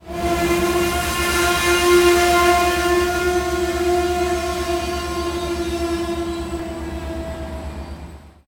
train engine slow break and motor

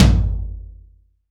roomy tom sample g sharp key

